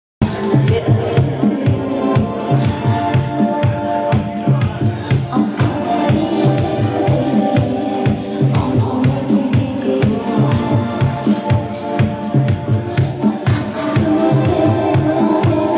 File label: moan, noise